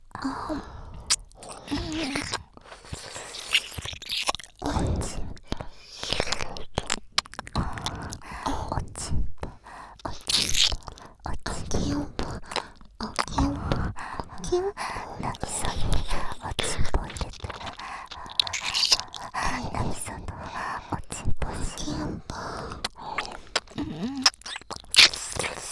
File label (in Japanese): 耳舐め; お屋敷メイドのハーレム淫語ヘヴン 気が済むまで耳舐め淫語を堪能できるバイノーラルオナサポ音声; 静華＋